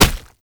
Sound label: Proto Infested Step; Enemies; Steps; Warframe; Brachiolyst